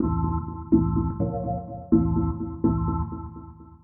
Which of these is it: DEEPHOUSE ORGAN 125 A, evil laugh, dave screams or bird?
DEEPHOUSE ORGAN 125 A